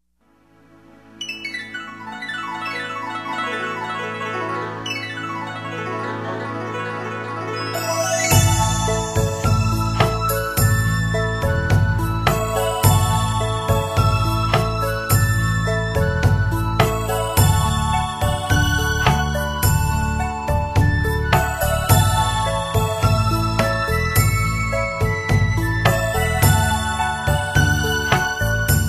Instrumental, Great Compassion Mantra; Nhạc-Niệm-Phật

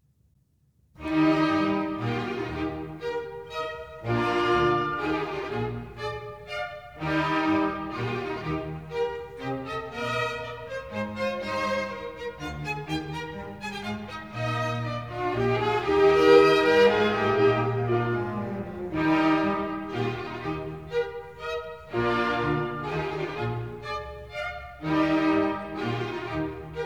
Minuet for Orchestra in Eb; Wolfgang Amadeus Mozart; Dances and Marches

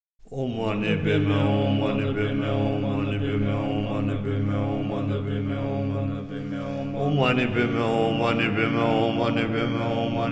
Om Mani Padme Hum, Sakya Monastery; Tri Chu Om Mani Padme Hum

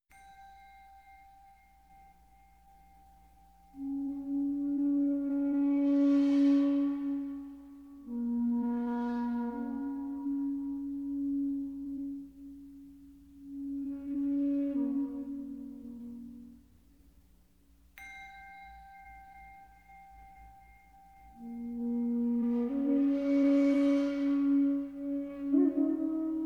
Lullaby Of The Whale, 432hz; Nhạc-Thiền-Tĩnh-Tâm-432hz

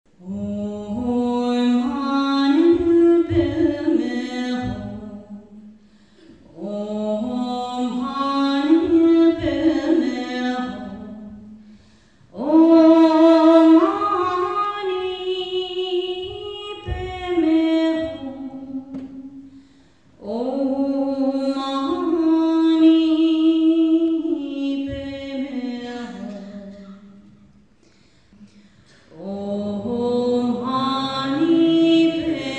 Om Mani Padme Hum

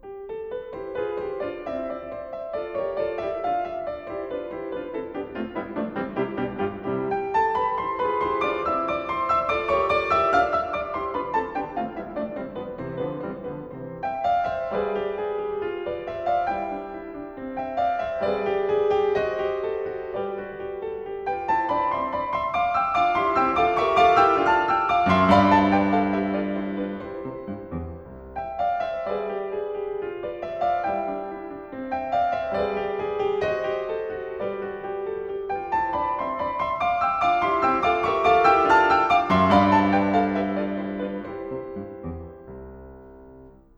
C major, Menuet; 12 Variations; ''A la Vigano'' by Haibel WoO 68 - Var. 3; Ludwig van Beethoven - Complete Works [Brilliant Classics 100 CD Box]; Piano Works

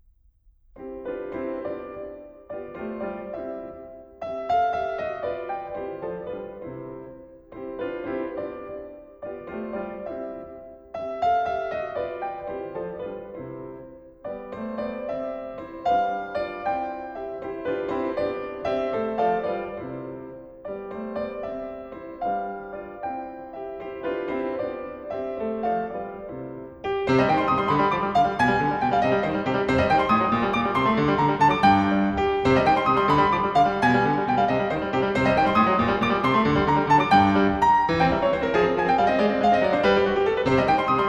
Bagatelle Lustig-Traurig, piano; WoO 54; Ludwig van Beethoven - Complete Works [Brilliant Classics 100 CD Box]

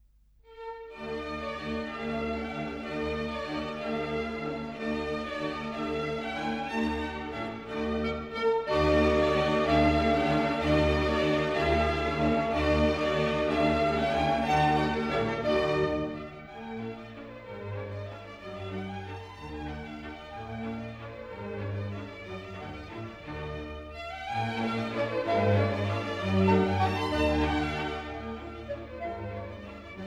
Deutscher Tanz, Walzer; Die Geschopfe des Prometheus, Ballet Music Op.43; Musik zu einem Ritterballet WoO1

